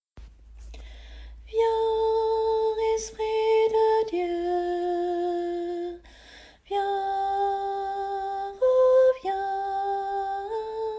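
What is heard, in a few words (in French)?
Viens esprit de Dieu soprano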